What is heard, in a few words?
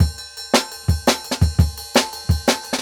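Jungle Breaks, 170 bpm; Recessed; Original Jungle Breaks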